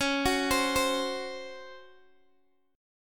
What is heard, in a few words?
C sharp Major 7th Suspended 4th C sharp, Guitar Open D